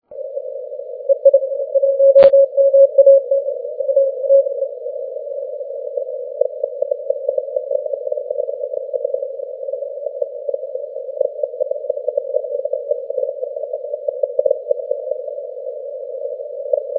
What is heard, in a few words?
30m cw